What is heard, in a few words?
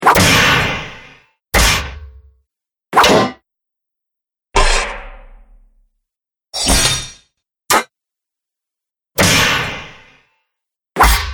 Sword And Weapons Hits; Sword Sound Effects